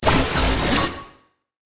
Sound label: multi blast